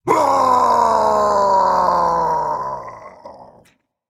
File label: fear, voicepack; smite; jorm